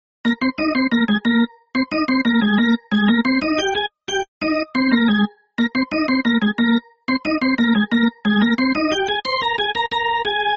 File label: sample melody